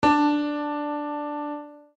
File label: instruments piano re; maoudamashii